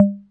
per, Roland TR-66; drum machines

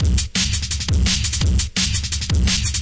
170 ruff stepper; 161 to 180 bpm

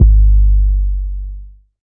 urbantrap kick; Hip Hop; Urban Trap Kit; style kits

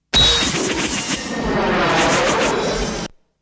zoom fx; Various Strange Sounds and Effects

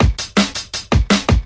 163 greatfeel, drum loops and breaks; 161 to 180 bpm

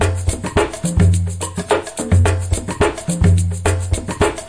107 bpm loose brazil

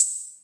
hho, Roland TR-66; drum machines

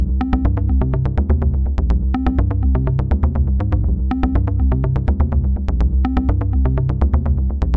123 acid bass; SYNTH AND ELECTRONIC LOOPS

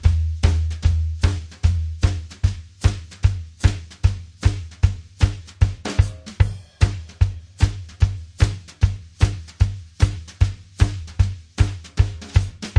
150 shuffle drum journey, drum loops and breaks; 141 to 160 bpm

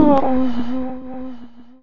oooooh, FEMALE SINGING; VOCALS and SPOKEN WORD

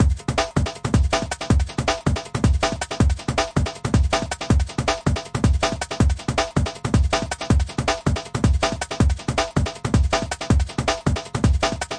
160 bpm simple dnb delayed; 141 to 160 bpm

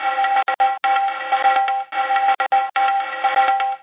157 tablaesque, drum loop; 141 to 160 bpm

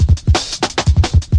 172 double kicker, drum loop; 161 to 180 bpm

